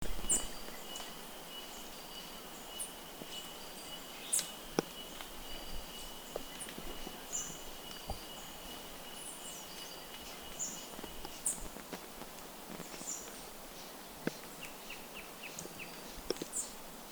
Blue-Dacnis, پرنده توتخوار آبی; صدای پرنده توتخوار آبی

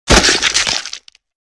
Bleeding; خونریزی

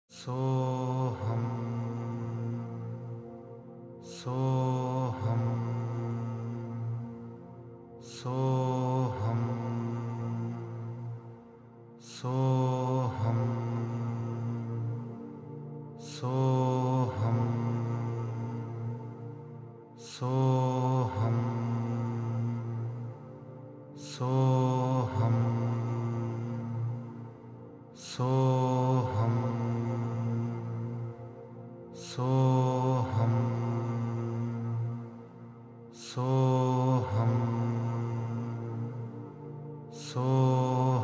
Soham, مانترای سو هام

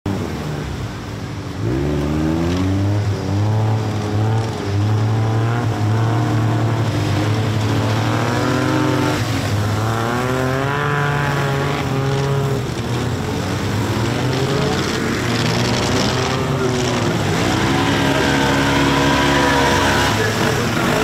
suzuki carry

